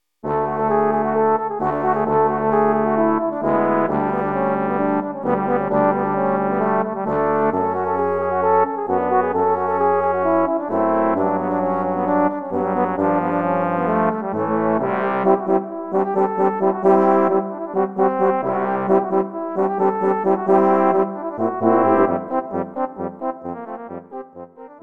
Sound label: Romanian Folk Dance